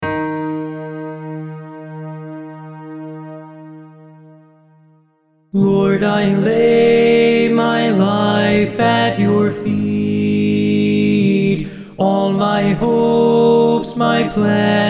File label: TENOR